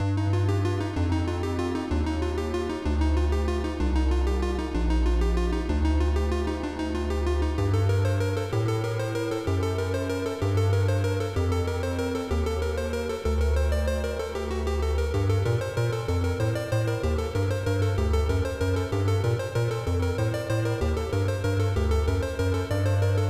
Mazurka; Kyuuyaku Megami Tensei; SNES

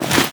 foley run; archer; Aragami

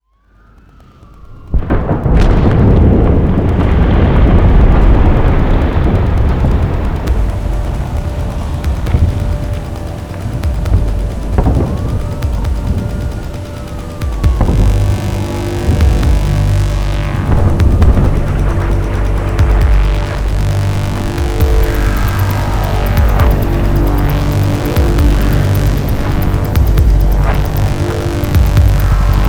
Siege Music Loading; Battlefield 4

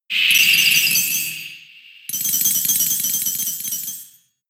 Hail; Pokemon; gen 6; XY; ORAS

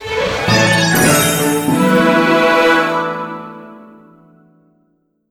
Levelup; Cookie Run Kingdom

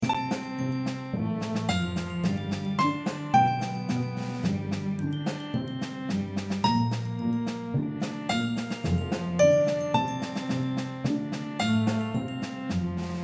Orchestra; dragon fantasy book i the volumes of westeria

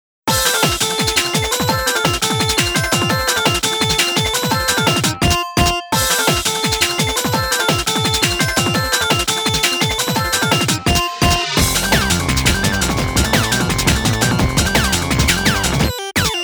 The Chiptune; Fairy Fencer F

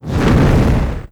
Fireball Fire

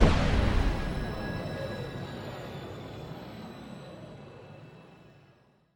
effect move loop

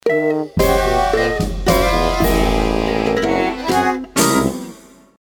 Failure; The SpongeBob SquarePants Movie video game

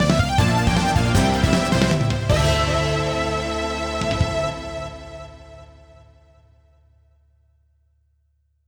Game Over; Wild Guns Reloaded

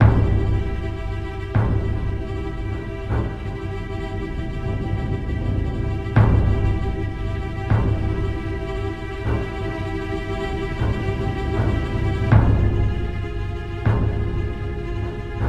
Tense; Evil Dead Regeneration

